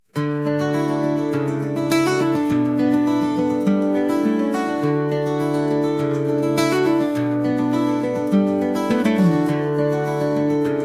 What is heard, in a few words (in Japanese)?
アコースティックギター 激しい